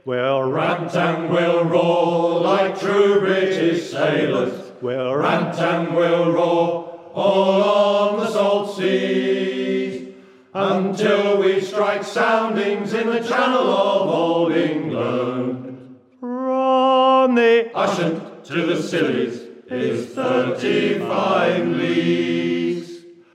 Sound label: spanish ladies